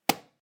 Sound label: hit alarm clock; butterflysoup2